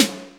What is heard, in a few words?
Snares Real